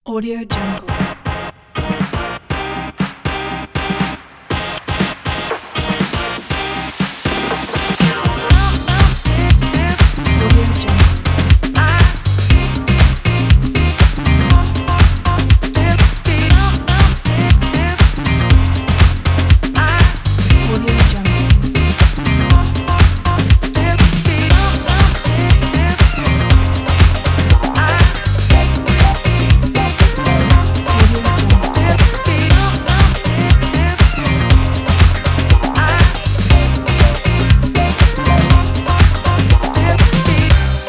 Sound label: funk